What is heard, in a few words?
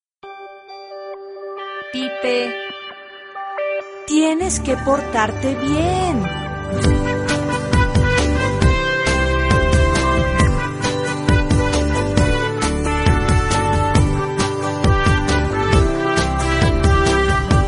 pipe